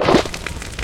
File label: jump; PixelPerfectionCE; minecraft; mob; magmacube